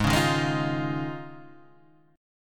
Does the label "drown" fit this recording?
No